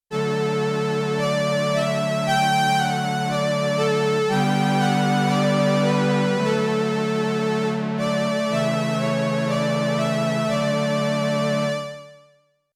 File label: Single Vibrato String, Prophet 5; Yamaha TX16W